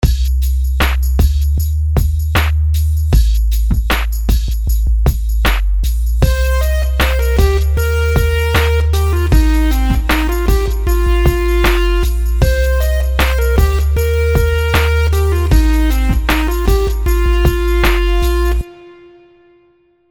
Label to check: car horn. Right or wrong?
Wrong